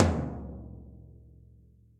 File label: Tom Floor Warm And Low; Drum Hits; Ableton; Factory Packs; Session Drums Club; MPE Hits